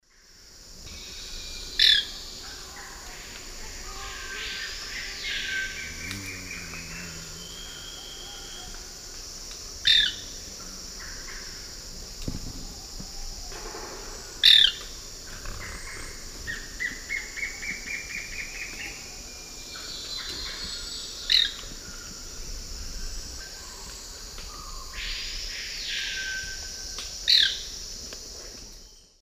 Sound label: Cercomacra serva, call and song; S. America; Eastern Lowlands